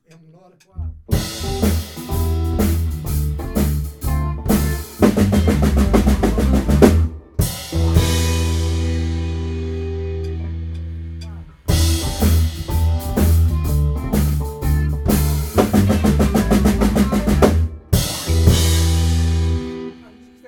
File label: Blues endings